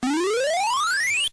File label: flagpole slide